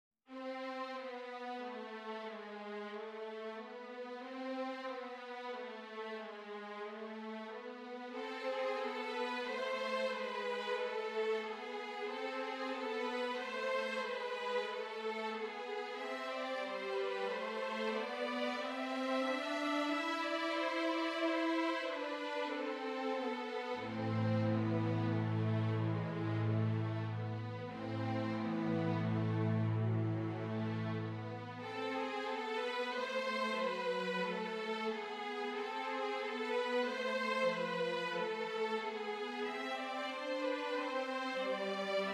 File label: Funeral Dirge